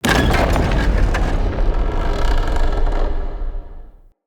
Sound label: Drawbridge Start; UnderCity